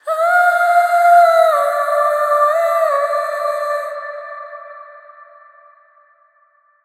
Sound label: Vocal Swell